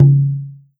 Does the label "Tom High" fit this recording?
Yes